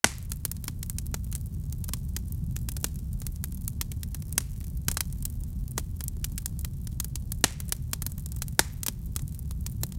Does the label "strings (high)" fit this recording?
No